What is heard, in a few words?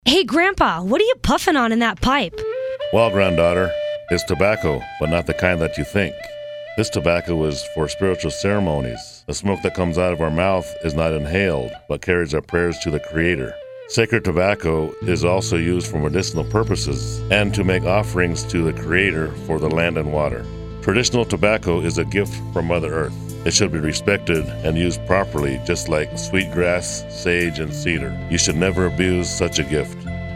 Inter Tribal